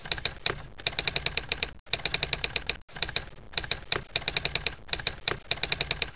type; cstrike

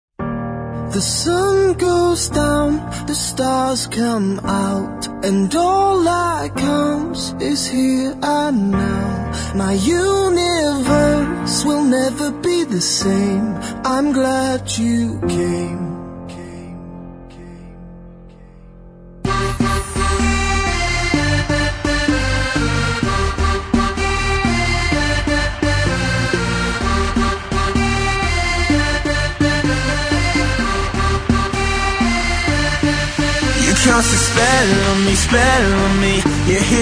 loading; csproject

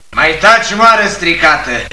shh

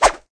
knife slash; cstrike

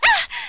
zombie regen pain; cstrike; zmod

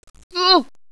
aah; radio bot

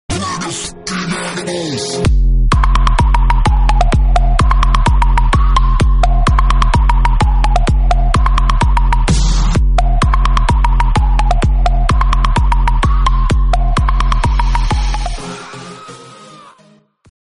zombie win; cstrike

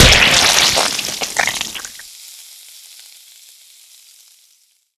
boomer explosion; zombie plague

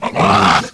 zombie pain

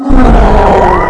Battle Roar; cstrike

